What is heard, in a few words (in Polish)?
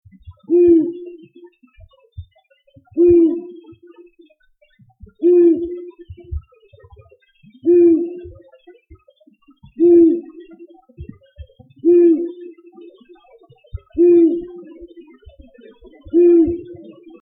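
uszatka głos terytorialny samca; ptaki glosy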